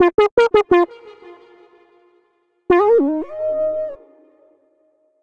Evening Casual Bb Minor 89 bpm; MIDI Clips; Tonal; Melodic; Ableton; Factory Packs; Chop and Swing

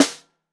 Snare Bottom

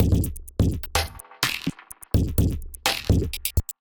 Kit groove 126 bpm; MIDI Clips; 122 - 130 bpm; Snake Paw Kit; Glitch and Wash; Ableton

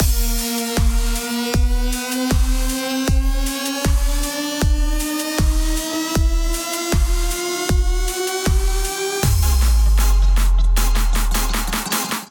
Auto Theft Kit groove 128 bpm

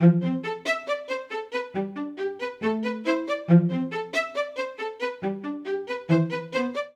Phrase Precise A Minor 138 bpm, string quartet; VI-VII-i-VI-VII-v; MIDI Clips; Craftwork; Ableton; Factory Packs; Spitfire Audio

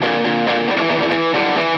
134 Garage Guitar Em; Dance DJ

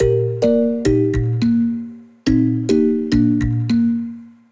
Cheerful Kalimba - Eb Maj 106 bpm; MIDI Clips; Tonal; Motifs; Ableton; Factory Packs; Mood Reel

